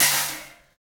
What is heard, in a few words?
LID, INDUSTRIAL; Roland - LA Composer vol. 1